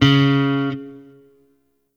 Shawzin Oct Three C Sharp; Warframe; Sentient Shawzin

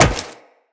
Tenno Wall Jump Metal, footsteps, wall run; Characters

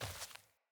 Sandals Walk Dirt; Player; Footsteps

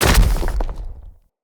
Root Spider Mother Land Dirt, Spiders

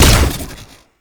Fire Shot; Duviri; Incarnon Dera Vandal; Weapons

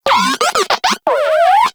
Moa Compassionate Vocal Greeting; Pets; Warframe

